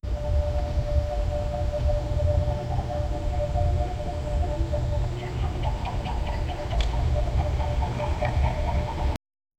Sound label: the alpaca